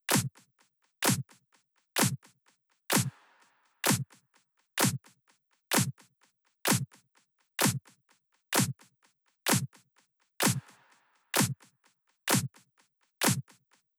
Drum Loop 128BPM CLAP; VEC5 Drumloops; VEC5 Multi Loops 128BPM; Loop Elements

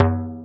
Frame DR lp, EMU Orbit-3; 300 Drum Machines

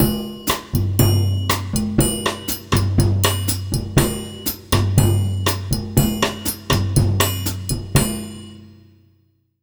120, Brazil Bossa; USB Soundscan; AKAI

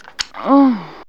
SEXVOX; X-Static Goldmine 1

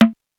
808 CONGA; Greytsounds VOL-1; CLASSMACHINE

